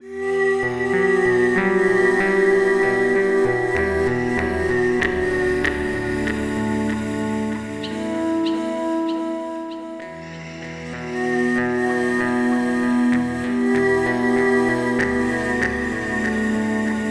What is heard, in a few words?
pad